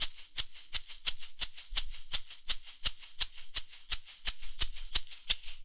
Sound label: R&B Shaker; R&B Funk Soul; Digidesign; Pro Tools; Pro Tools Essential Demos; Essential Loop Demos; Demo Session